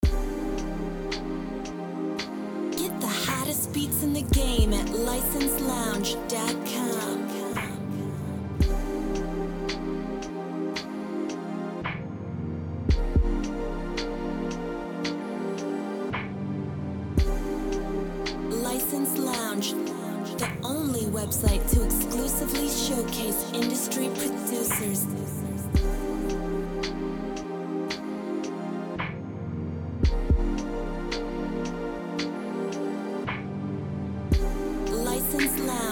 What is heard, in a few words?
56bpm TYPE BEAT